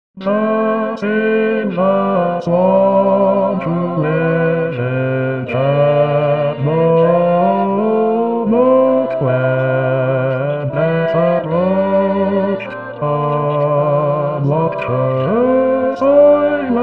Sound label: Bas; Silver Swan